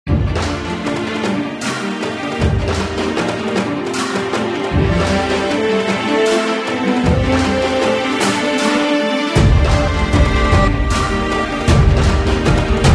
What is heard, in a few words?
Battle BGM